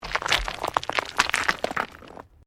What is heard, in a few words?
STONES MOVE